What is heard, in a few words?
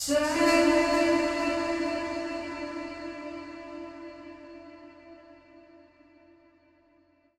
130bpm Am, Vocalpack